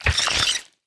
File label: Death Bug; We Are the Dwarves